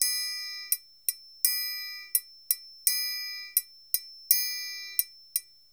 PERCUSSION LOOPS; Sampleheads; NYC Percussionworks; ER LOOPS 84

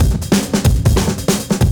Beat Style, 140bpm, breaks

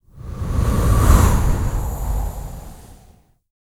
VOX FX, BREATH FX

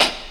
HH, HIHAT HIP, Dance Mega Drums; Best Service

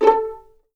A4 PIZ F, VLNS PIZZ; East West; The Ultimate String Collection

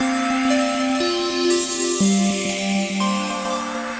Synth Textures

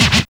SCR LONG, SCRATCHES; Drums In Your FACE!

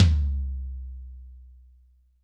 AMBTOM, DRY+AMB TOM

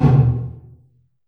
LR MT909, TR909

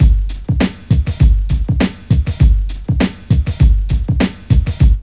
SHUFFLER; Zero-G; Funky Elements

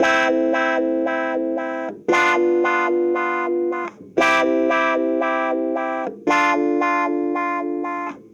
Cruisin' Disco Clavinet, 70s Analog Funk; Sonic Foundry (Sony Creative Software); What it Is!

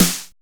MX-909 SNR3; Drums In Your FACE!

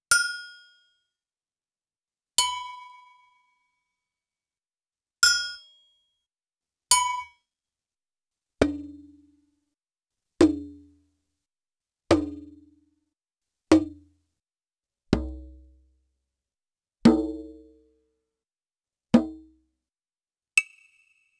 Didgeridoo And Overtone; Good Stuff Audio

